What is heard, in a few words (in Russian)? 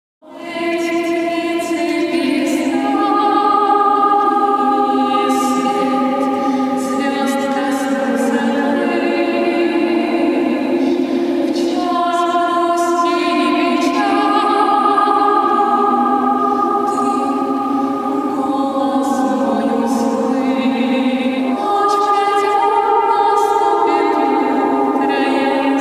Penie v share